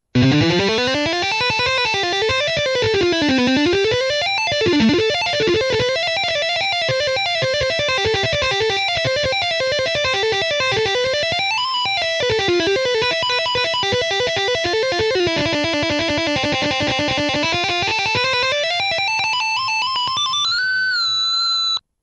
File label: solo